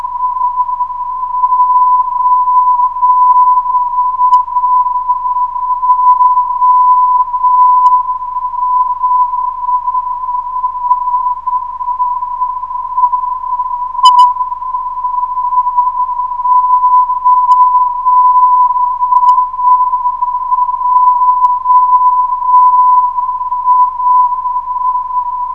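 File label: 485.82kHz, non-directional beacon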